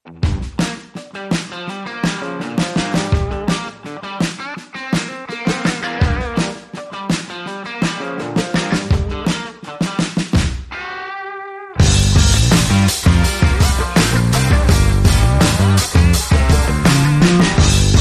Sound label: No Vocals Leadguitar Organ and Tambourine